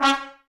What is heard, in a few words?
brass, Woodwind Brass Ensemble; Miroslav Vitous